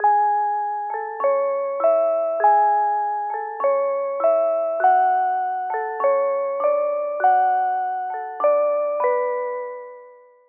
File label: Lofi Melody Part A Maj